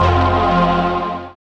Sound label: synth bass, dance synth; Sound & Vision - Gigapack I CD 2 (Roland)